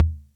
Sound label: Percussion Computer Tom